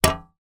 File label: industrial shovel metal light impact hard ground; zapsplat